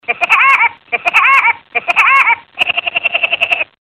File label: Woodpecker Sms